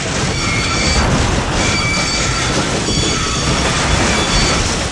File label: Grind Large Metal Door Loop; Doors